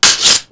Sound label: sword slide; Pirates Of The Caribbean; DUEL